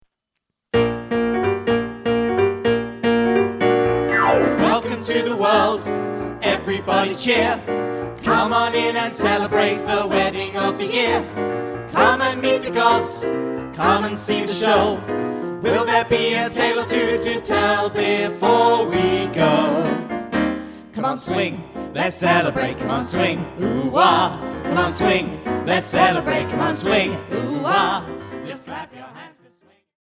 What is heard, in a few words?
swing; pandemonium a greek myth adventure